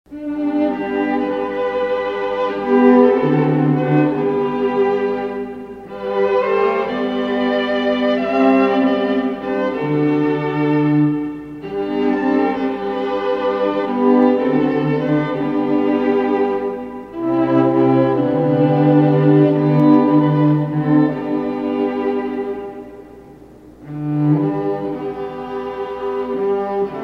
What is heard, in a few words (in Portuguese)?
quarteto de cordas; hinos ccb